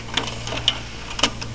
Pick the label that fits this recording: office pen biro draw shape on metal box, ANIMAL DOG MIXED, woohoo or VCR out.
VCR out